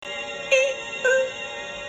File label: beep boop